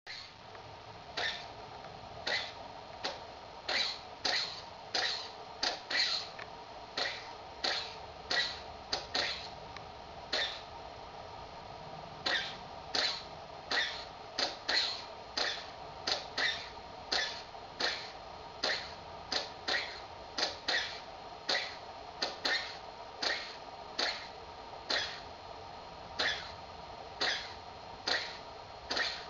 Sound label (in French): Le cri du loir la nuit